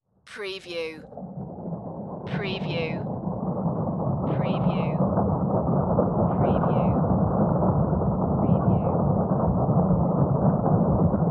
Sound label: Rhinoceros Herd Distance